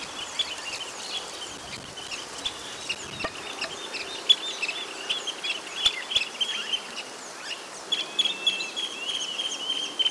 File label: Pearl kite; پرنده کورکور مرواریدی